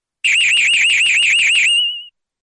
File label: pachinko